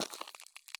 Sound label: walk gravel; Escape from Tarkov